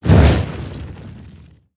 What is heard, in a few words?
Earth Swing; Spinjitsu